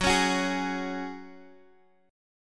F sharp Suspended 2nd

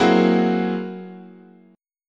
F sharp 9th Flat 5th, chord

